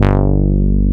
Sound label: JX BRASS, Jupiter Synths; E-MU Formula 4000 Series Vol. 3; Analog Odyssey